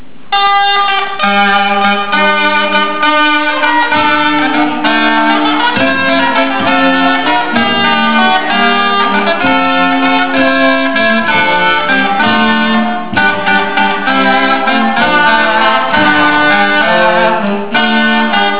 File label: loud band rehearsal